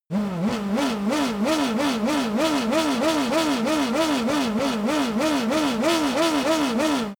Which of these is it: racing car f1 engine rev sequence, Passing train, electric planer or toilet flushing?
racing car f1 engine rev sequence